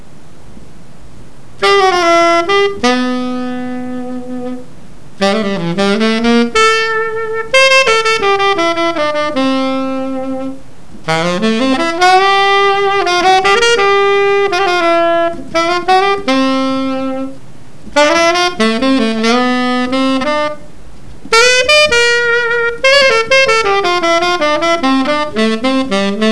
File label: c melody